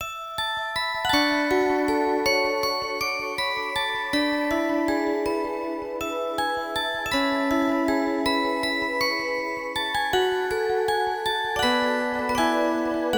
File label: Music Box; Porco Rosso